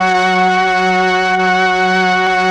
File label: STR F, STRING; Best Service ProSamples vol.55 - Retro Sampler; AKAI; CHOIR+STRING